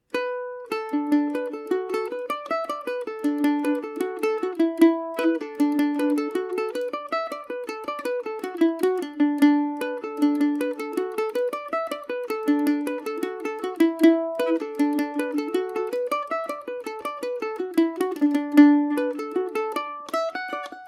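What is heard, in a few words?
D major, Irish mandolin; merry maiden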